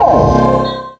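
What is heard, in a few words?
take health; alienshooter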